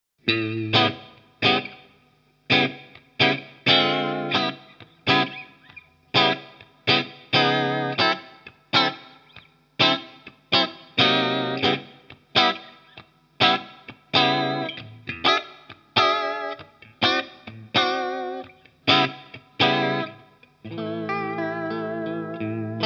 vib blues leslie sinus; Walrus Audio Julia

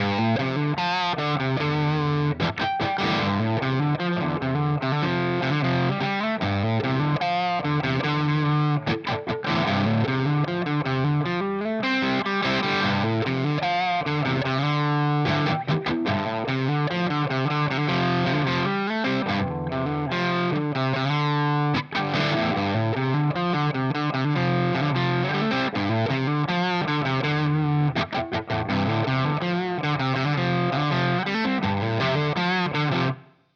Germanium Fuzz Single Coil Gain 2; Benson

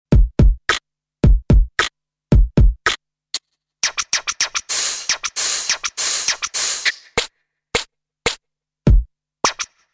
Drums; Artiphon ORBA 2 Tom Thum Kitt

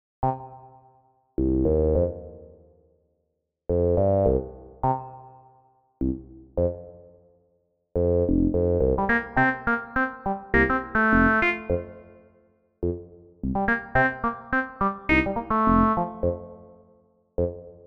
Fractalize Piano

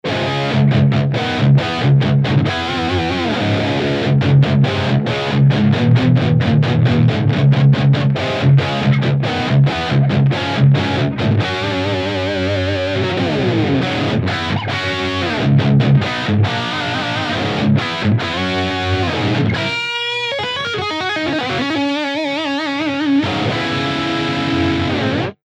BOSS GT1000 Metal OD

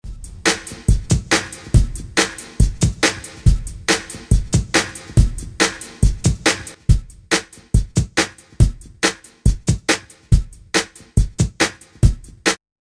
spl reverb

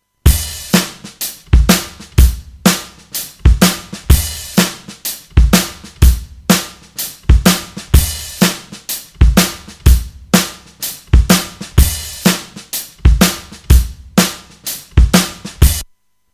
Acoustic Drums EQ THD High

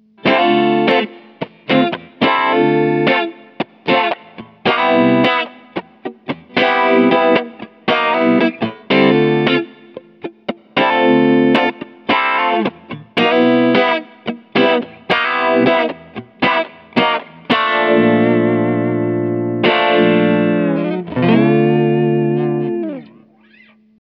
Comp on Switch Bright Rhythm; Auto Quack

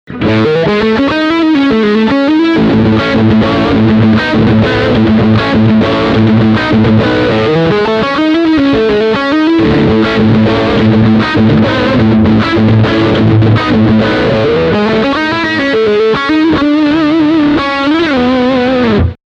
Humbucker Steg passiv

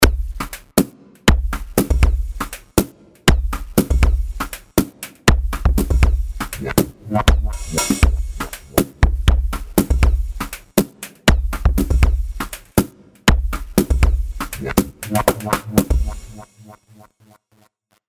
DrumComputer Bass Dark

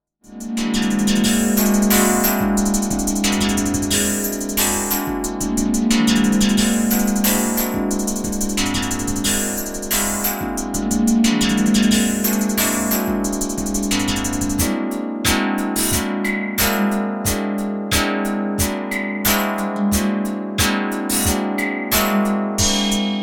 Eventide H3000 String Modeller

